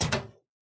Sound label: walk